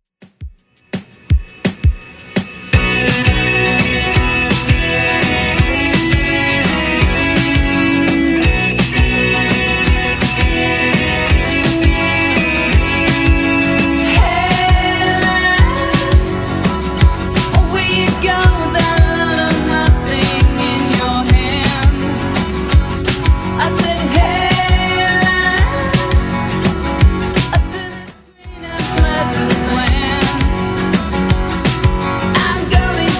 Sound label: 80s, 168 bpm; Redrum